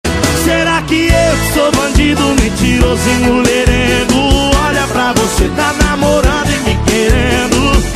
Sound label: huhu